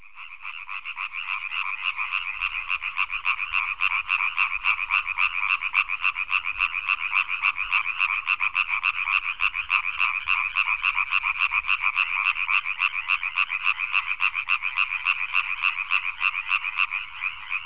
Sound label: hyla arborea